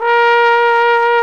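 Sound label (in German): POSAUNE VIBRATO; Best Service; Alpine Volksmusik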